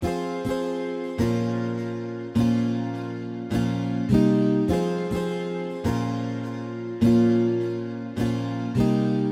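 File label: Guitar Loops F minor 103 BPM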